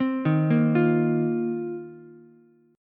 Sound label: C Minor 11th C Ukulele C6; arpeggiated; JGuitar